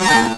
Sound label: ROBOT ARM; HIGH TECH